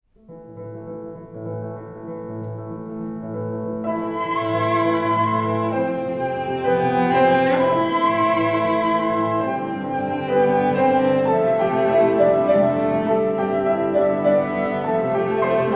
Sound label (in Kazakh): kuii fleita violonchel